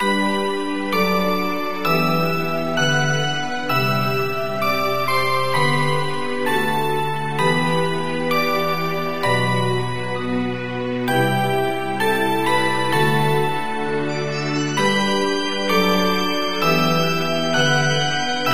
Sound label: Heaven; MapleShrine